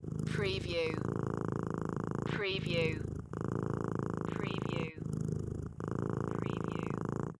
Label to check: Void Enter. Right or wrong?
Wrong